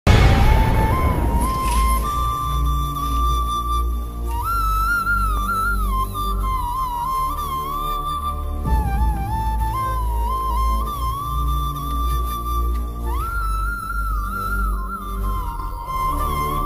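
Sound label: love ringtone instrumental background music; sita ramam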